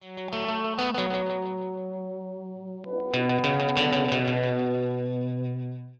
western

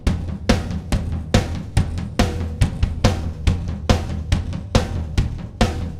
dyn drums

